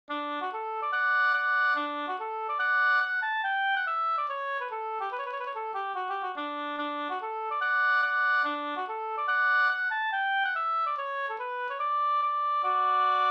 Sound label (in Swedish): schottis; Tierpssschottis av Olof Jansson Up